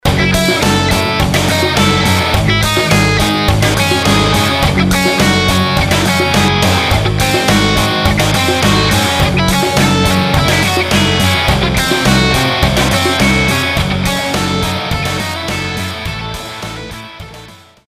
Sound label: example Hard, trip hop